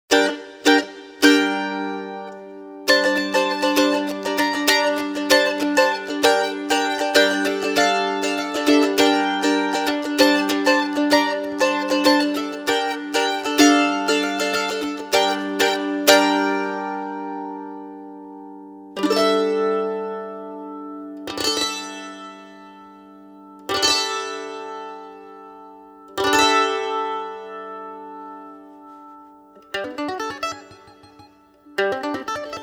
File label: Mandolin